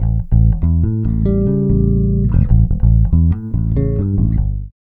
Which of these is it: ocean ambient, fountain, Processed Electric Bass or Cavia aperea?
Processed Electric Bass